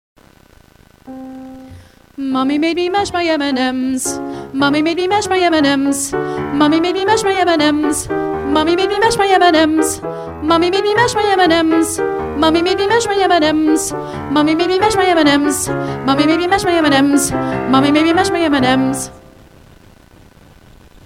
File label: Warmup, Vocalization